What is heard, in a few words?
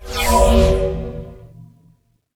Shield; Hero; Karkinos